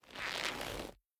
Leather Foley; The Town

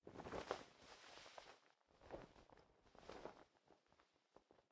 Noren Cloth; The Inn

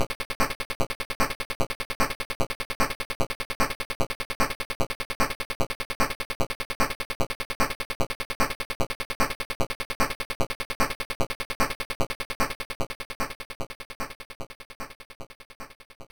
Test BGM Sound Test; Wonder Boy The Dragon's Trap Game Gear

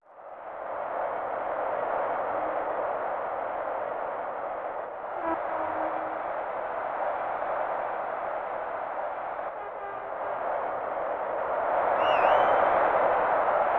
In-Game Ambience; Ken Griffey Jr. Winning Run; SNES

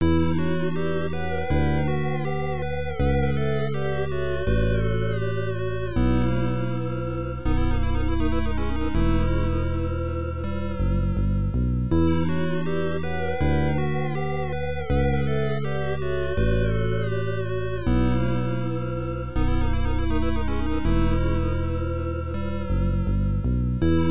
Low on Magic Power; madou monogatari ars; pc-9801

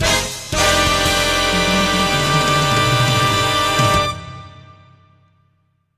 Trash Chute Music (Stop)

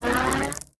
Heat Beam Charge

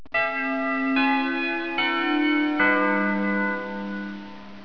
Belltoll

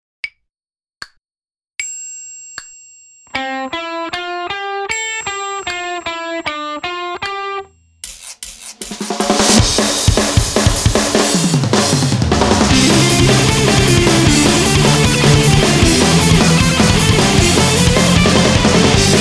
Guitar Practice

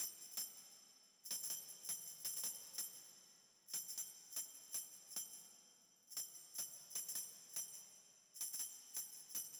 Tambourine Layer

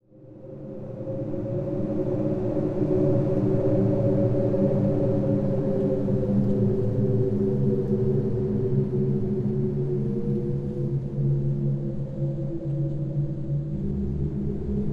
Wind; Oriental Empires

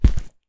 Footstep; backrooms 2d android ios online windows gamerip

